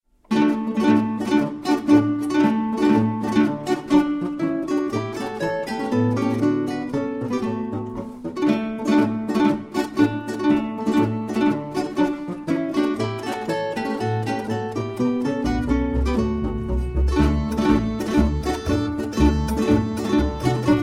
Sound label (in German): Sitzeckn Polka